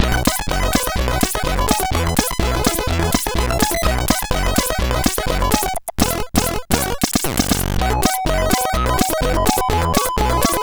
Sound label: sid CSG8580R5